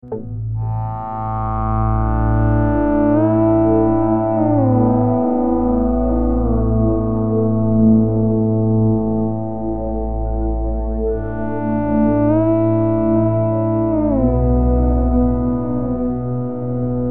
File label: Animoog test